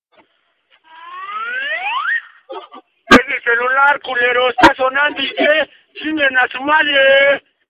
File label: ring